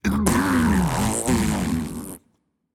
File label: tongue, voicepack; smite; jorm